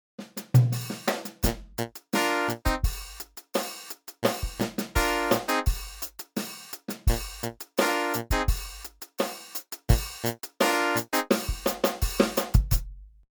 Poly Synth Analog Clav; Ultra Analog Session

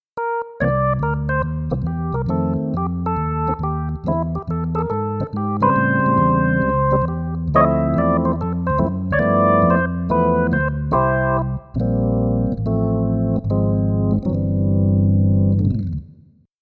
Pianorgans Full Slow; lounge lizard theme packs

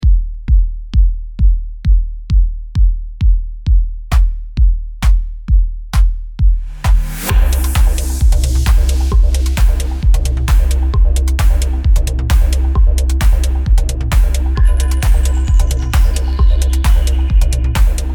acid alert, sound pack for Multiphonics CV-3; rotors; adam pietruszko

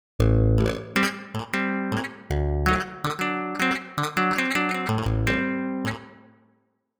Damper On; SS Masterclass; Masticateur

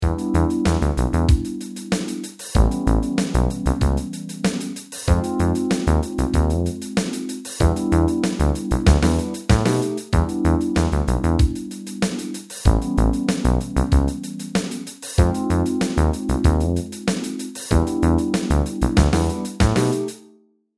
Funk, Funk Disco Basses; UA Theme Packs

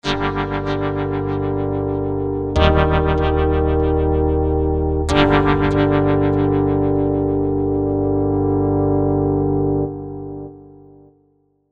Tremo Trip Guitar; UA Masterclass; Trip-Hop

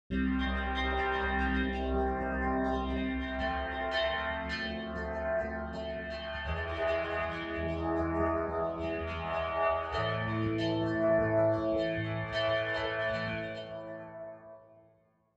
Smooth Bouncing; SS Masterclass; Damper

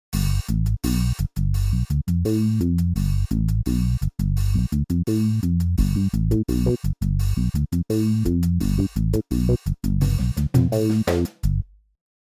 Bass Disco; Ultra Analog Session

